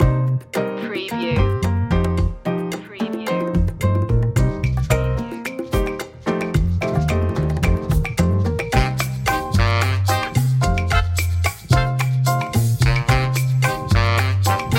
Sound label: havana lounge